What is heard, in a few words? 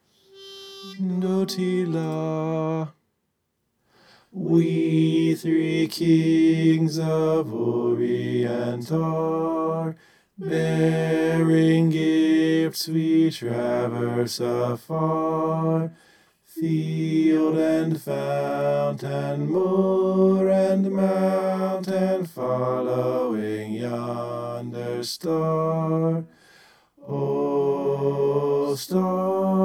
bass